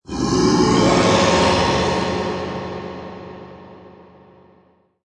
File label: Growl Reverb Cave, Single monster or animal growl, snarl, sneer in a cave; with heavy reverb; Monster Sound Effects; Horror; LynneMusic